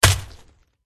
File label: warrior attack contact bottom